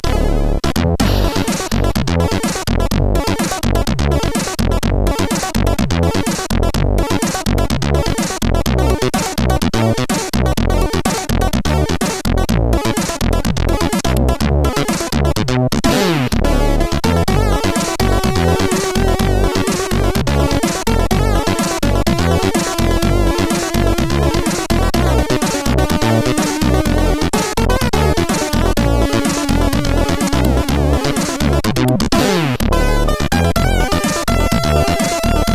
Tropical Funk, sid CSG8580R5; hvsc

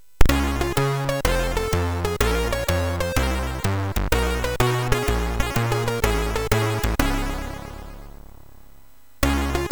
JAZZZ, MOS6581; MOS6581R3; hvsc

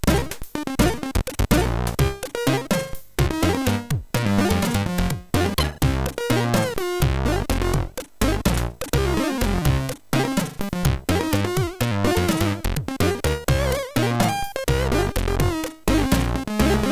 Just Walk Along With Jazz, MOS 6581

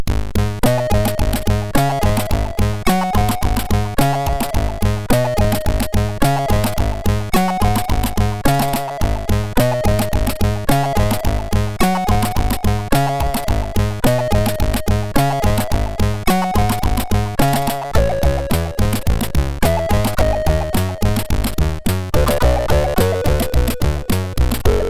sid MOS6581R4; Nautilus remix

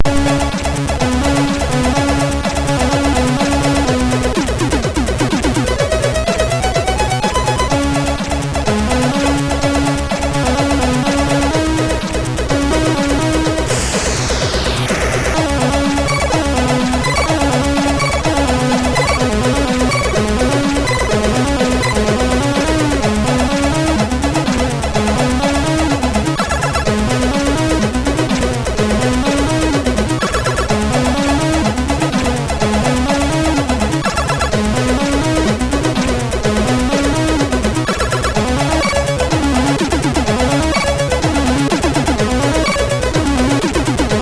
Short Introtune, MOS6581R3; sid

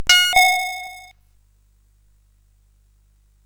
SFX; Narco Police

